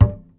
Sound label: NOTE 2G, Upright Acoustic Bass; Larry Seyer